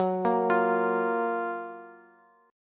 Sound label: F sharp Major 7th, Bass; arpeggiated; JGuitar